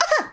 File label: coughing; birdo; SM64 CO-OP